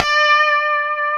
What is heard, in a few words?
D, Guitars; Spectrasonics; Hans Zimmer Guitars VOL-1; COMP POP+VIB